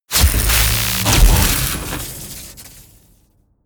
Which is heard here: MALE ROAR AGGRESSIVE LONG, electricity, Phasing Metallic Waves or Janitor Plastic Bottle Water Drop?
electricity